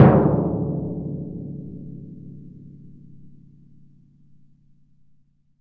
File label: TMP, Synclavier Percussion; ILIO; Synclavier Percussion 2; World & Orchestral